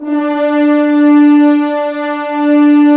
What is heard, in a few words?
Horns; Roland - LA Composer vol. 4